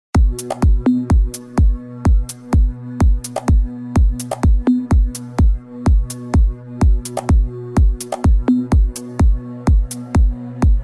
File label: electro club ringtone